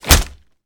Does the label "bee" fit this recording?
No